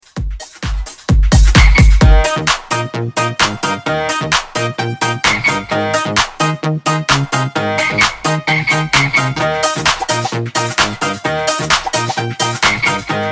130 Bpm

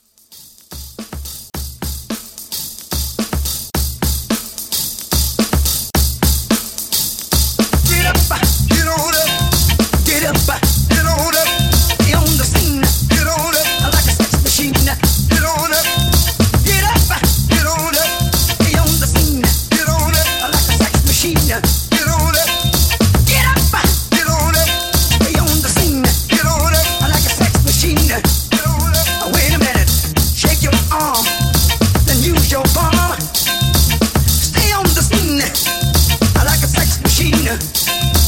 70s Funk Re Drum

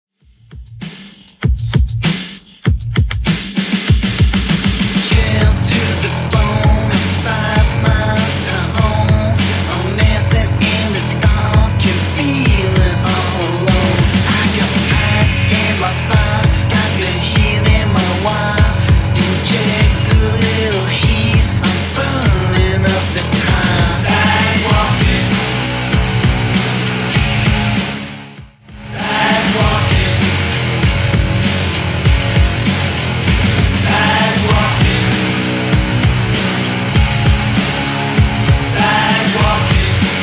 80s Redrum 98bpm

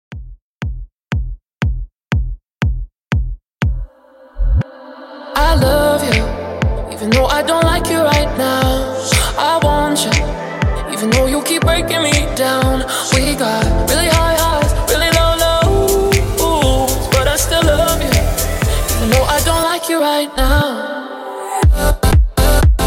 Club In Outro 120Bpm

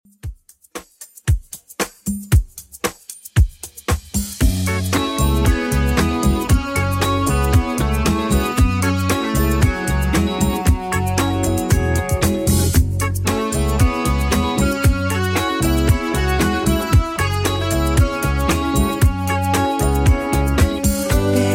80s Redrum 115bpm CLEAN

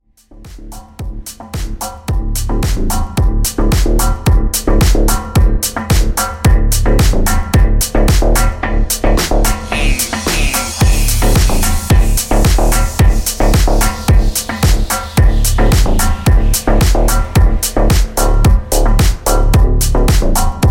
Trap Rwork Remix 110BPM; SNIPPET

